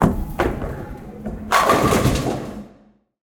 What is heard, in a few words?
strike; SnootGame